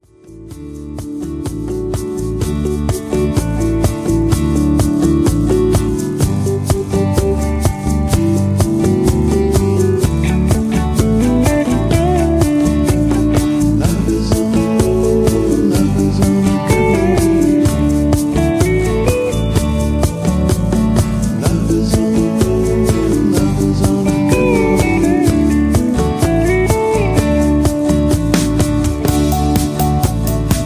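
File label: Instrumental with Melody background vocals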